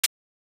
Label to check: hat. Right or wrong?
Right